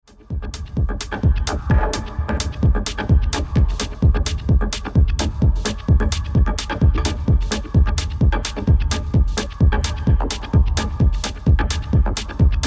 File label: organ grind